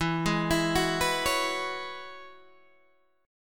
E 6th Add 9th E down Guitar DADGAD

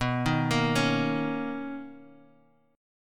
B Major 9th B, Guitar, Drop D

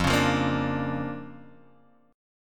D Minor Major 7th Sharp 5th F, guitar

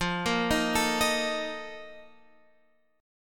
A sharp Major 7th Suspended 4th

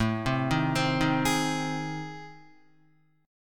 A Minor Major 7th Flat 5th, Guitar; arpeggiated; AmM7b5; JGuitar

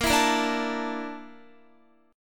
Bb Major 7th Flat 5th, Guitar; strummed

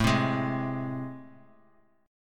A Minor Major 7th, down; strummed; AmM7; JGuitar

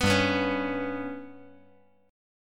G Major Flat 5th chord

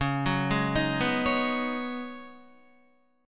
C sharp 9th Suspended 4th, C sharp, down, Guitar; arpeggiated; chord

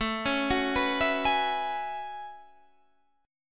F sharp Minor 11th

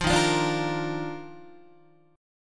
Eb Major 7th Suspended 2nd Eb down Guitar; strummed; chord; JGuitar

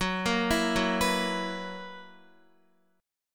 Gb 11th Gb down Guitar, Gb11 chord; Gb11 arpeggiated; JGuitar

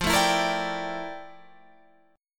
F Major 13th Guitar Half Step Down

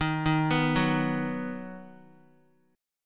Eb Minor Sharp 5th, Guitar; Ebm#5 arpeggiated; x,6,3,4,6,x; 4F,4A,5C,5G,5C,6E; JGuitar

